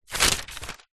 foley notepad page turn thin paper a4 push forceful finger